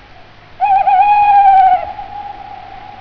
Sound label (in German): uhu